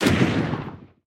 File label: aa 12mm fire last shot, guns; War Thunder